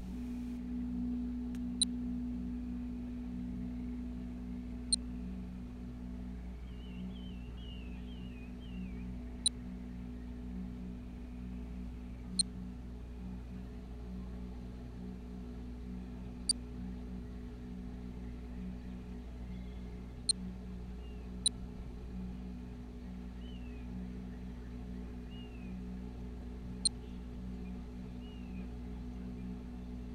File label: ambiance; Demeter; dialogues